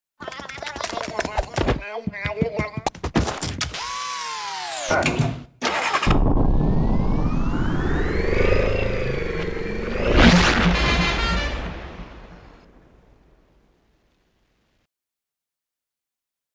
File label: aliens run to ship SFX